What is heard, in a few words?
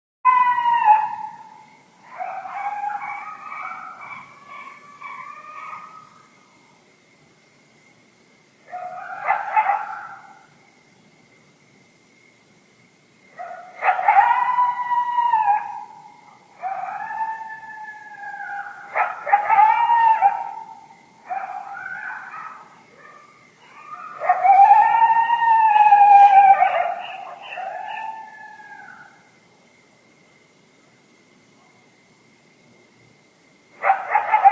howl